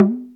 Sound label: TAMA, AFRICAN; AKAI S6000 CD-ROM - Volume 1; PERCUSSION